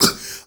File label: male solo, male noise